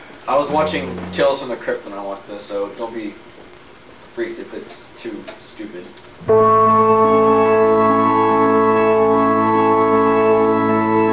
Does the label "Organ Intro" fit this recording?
Yes